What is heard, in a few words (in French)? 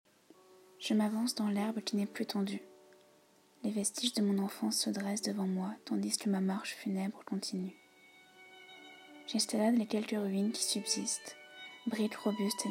Fantaisie musicale; section theatre du lycee de l'institut Dalzon de Nimes